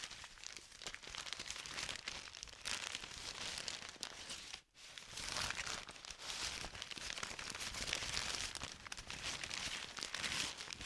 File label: Paper Rustling